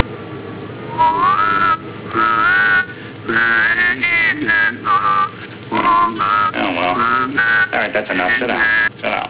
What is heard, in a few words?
data whistle